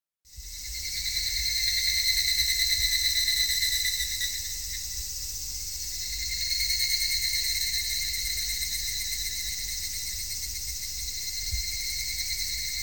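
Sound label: 3d sound cicada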